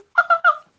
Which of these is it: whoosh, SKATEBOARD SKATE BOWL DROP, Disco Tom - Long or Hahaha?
Hahaha